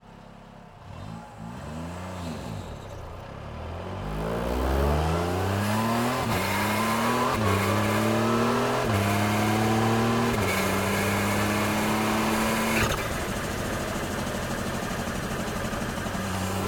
Peugeot 208 JWRC - ONBRD - FAST - Drive Various - ENGINE - Intake; Pole Position; Peugeot 208 JWRC 2020; DPA4062